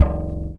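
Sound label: bass guitar E2, jazz bass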